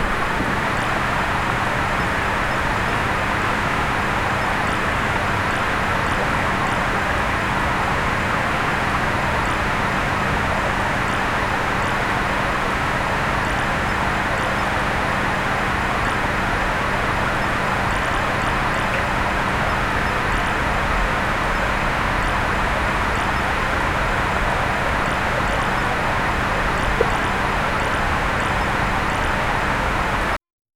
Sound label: crossing wooden bridge wi